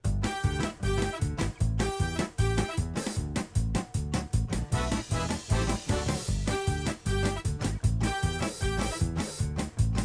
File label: jingle sing out